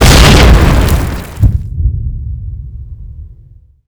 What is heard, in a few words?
grenade explo